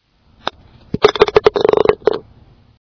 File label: Ball In Hole sound; kritter krawler